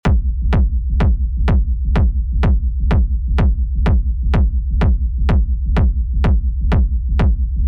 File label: kick techno industrial